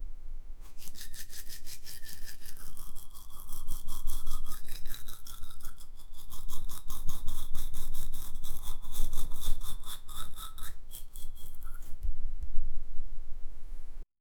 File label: brushing hair with comb; daily life